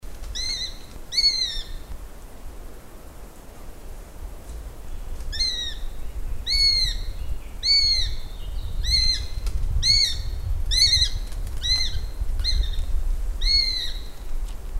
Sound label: Sparrowhawk